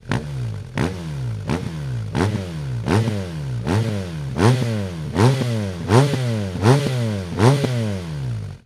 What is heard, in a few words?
hexa cone slip on exhaust bmw r1200 gs adventure; remus